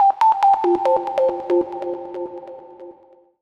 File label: TECHNO SYNTH 140; Keys